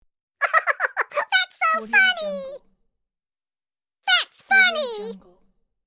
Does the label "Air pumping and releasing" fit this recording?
No